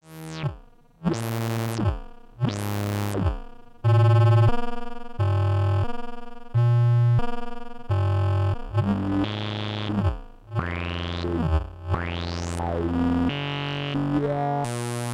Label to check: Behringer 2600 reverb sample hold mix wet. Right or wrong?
Right